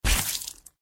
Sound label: Bite